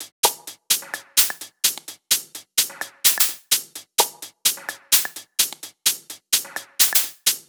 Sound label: 128BPM Drums, Tech House; Titano; Vengeance Samples; Bonus TechHouse Kits